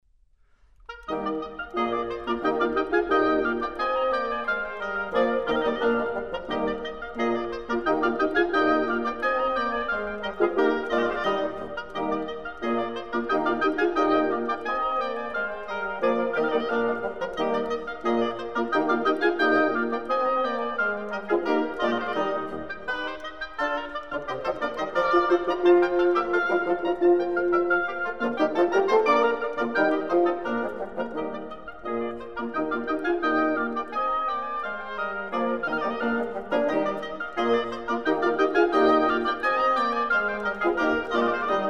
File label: Divertimento in E Flat, Presto assai; Mozart; Serenades and Divertimenti for Wind